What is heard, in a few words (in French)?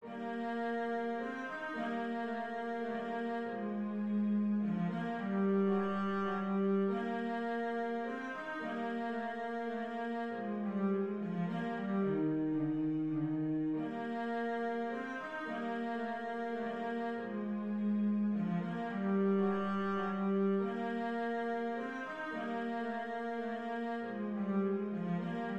danse allemande mib majeur; haydn joseph